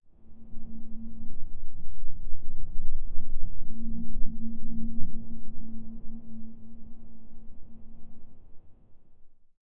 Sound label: low ambient wind tunnel d